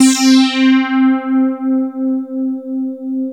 DX5 SYN; KEYS; Alpine Volksmusik

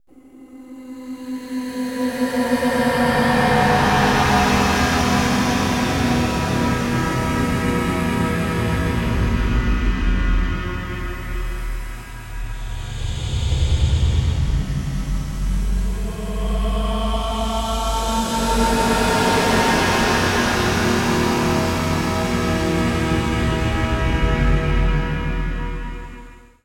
Textural Soundscape, IDM; Sonic Foundry (Sony Creative Software); Twine-IDM

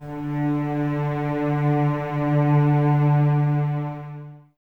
Cellos Expressivo, String Ensembles; Miroslav Vitous

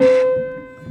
Prepared Piano; Battery 3 Sample Kits

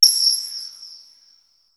synth bell; AKAI S6000 CD-ROM - Volume 5

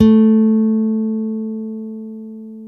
STEEL, Guitars

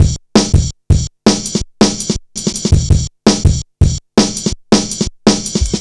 Drum Bass, Drumloops, 165bpm; Zero-G; Total Drum Bass

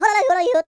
YODEL; ILIO; Vocal Planet

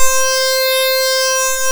ARP Synths, LFO SYNC G2; E-MU Formula 4000 Series Vol. 3; Analog Odyssey

